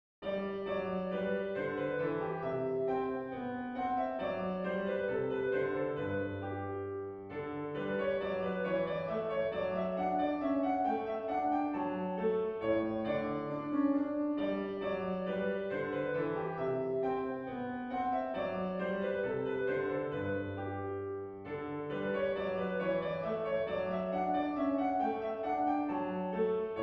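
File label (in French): bourree sol majeur; haendel georg friedrich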